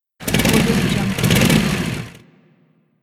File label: motorcycle rev